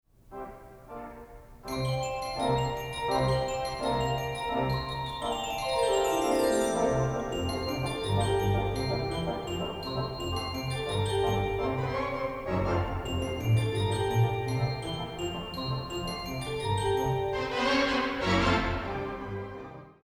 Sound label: Little Bells And Big Bells